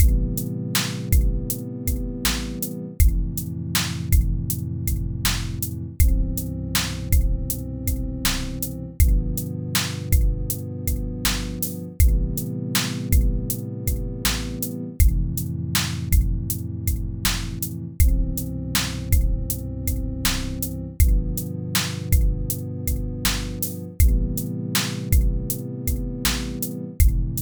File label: boombap 80bpm